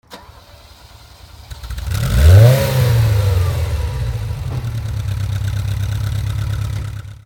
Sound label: ferrari 308 startup